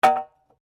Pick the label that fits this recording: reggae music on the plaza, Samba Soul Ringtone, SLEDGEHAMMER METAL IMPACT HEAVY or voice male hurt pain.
SLEDGEHAMMER METAL IMPACT HEAVY